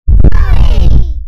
bass boosted; bluey

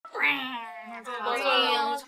rawr

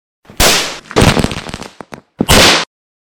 fireworks escalating sound effect

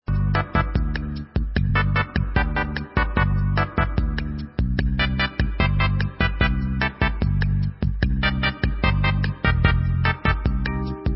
news bg music; wii

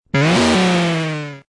roar 8 bit

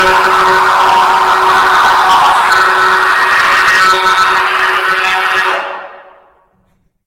jumpscare sound; five nights at burgee

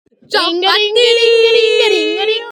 indian remix

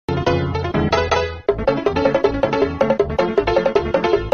cat plays drums

